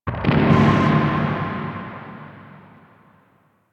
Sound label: Shotgun Tail; Warframe; Weapons; Corpus